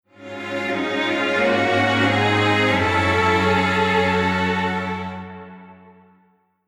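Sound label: Strings Polyphony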